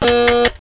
no power, ambience

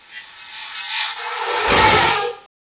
alien scream; ambience

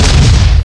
bang metal wall; ambience

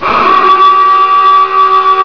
siren, ambience

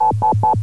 wep wep, ambience

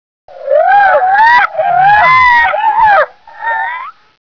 ambient xenome cry

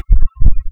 player drown

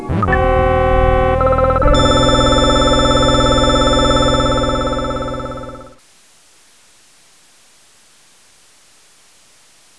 error

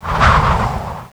steam burst

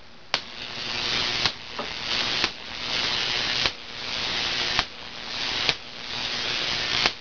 boss shock

